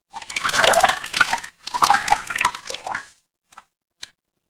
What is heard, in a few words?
water swirl